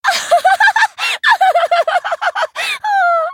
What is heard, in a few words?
laugh; Lux